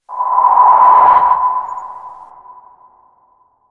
Ghost Presence Scream; Ninja Tracks

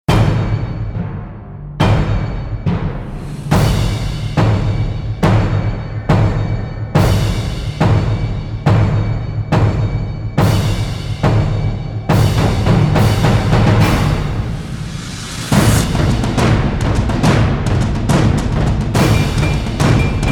Drums Only Version

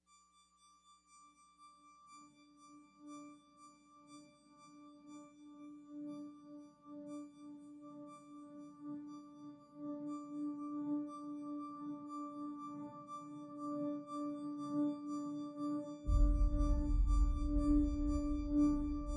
Oceans Of Time (No Piano); Ninja Tracks

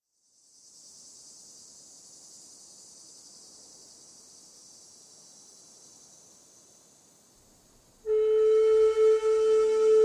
Cricket Dreams & Zen Sleep, Flute

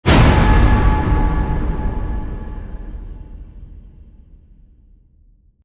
Hit Metal; Ninja Tracks

